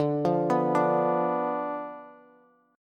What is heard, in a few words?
D Minor 6th Bass; Dm6 arpeggiated; JGuitar